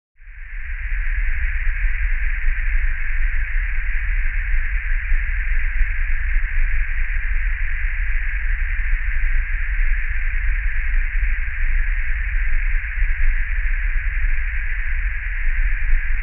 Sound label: Noise Disturbing Jun